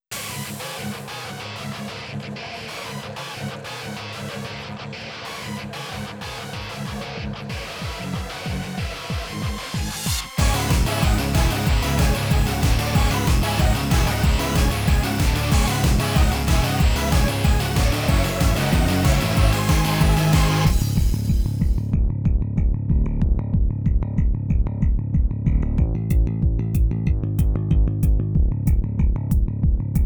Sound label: off vocal